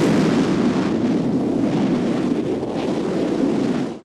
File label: boost hover body rocket; Titanfall 2